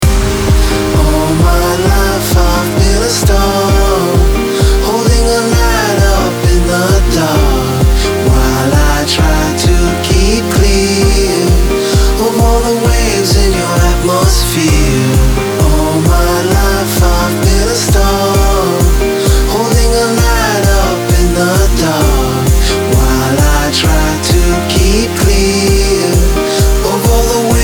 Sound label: Atmosphere; BodyJam 83